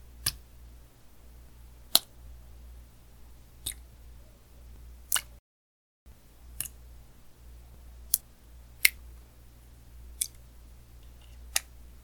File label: Slime Collision